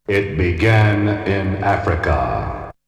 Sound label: Vocal; ZERO G - Datafile DISC 2